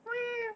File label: meow; Text-to-Meow